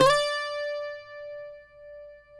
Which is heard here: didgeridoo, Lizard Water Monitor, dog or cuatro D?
cuatro D